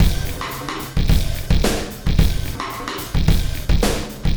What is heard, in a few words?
Wormhole Beat, Processed Drumkits